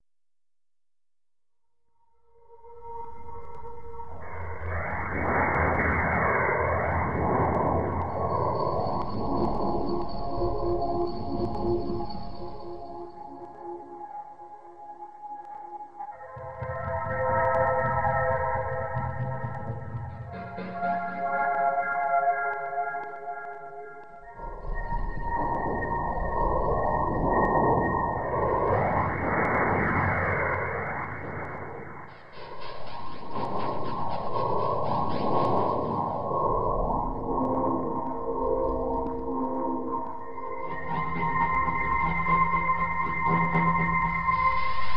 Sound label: A Wholetone 60, Deconstruction